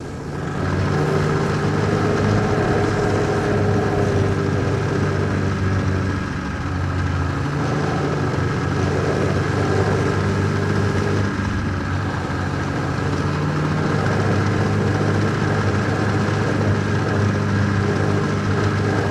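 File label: Snowmobile Run Around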